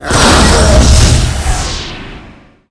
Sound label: stone attack, monster